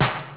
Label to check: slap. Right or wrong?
Right